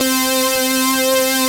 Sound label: BIG POLY SAW, SYNTHS; Pro-Rec - Synthsonic